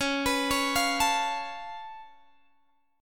C sharp Augmented 7th C sharp down Guitar Open G, chord